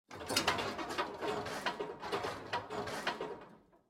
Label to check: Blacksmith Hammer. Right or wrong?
Right